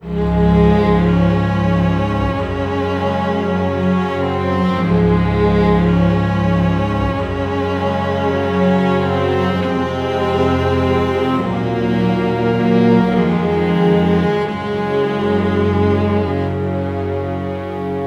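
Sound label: D major, Low Strings, 100; 90 s Sample CDs; PowerFX - Downtown Orchestra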